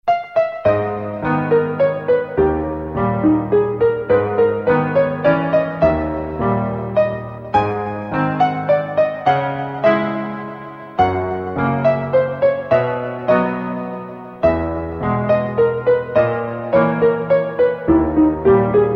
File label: sad song; super mario rpg